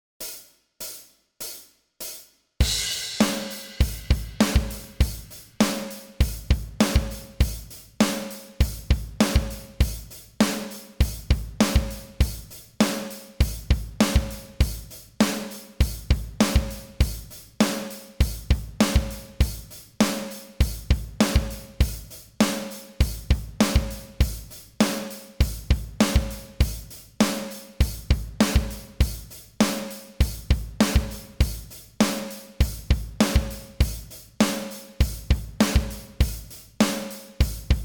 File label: 100bpm, bass